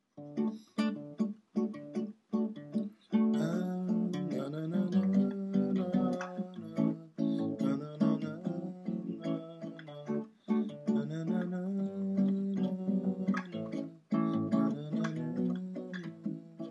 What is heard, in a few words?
Kinda Reggae